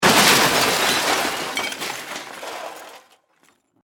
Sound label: Impact Crash Metal; Materials